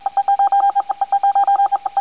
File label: beep beep; Gunlok